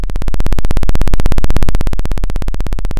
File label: Sound of a chainsaw